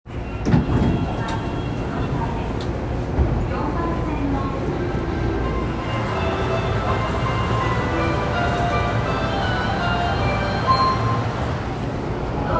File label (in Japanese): 半蔵門線青山一丁目東, train sounds